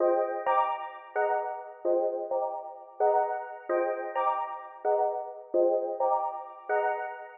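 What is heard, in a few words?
130 bpm C minor synth, Trance Synth Loops; Trance Explosion Vol1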